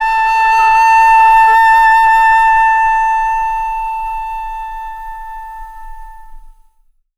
flute; Winds

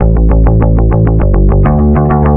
bass, Loops Phonky

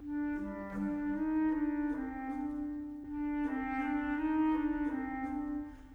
keys, Sad

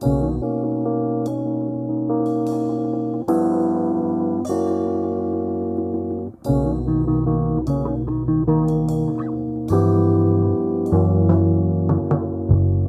guitar, Jazzmatazz Loops

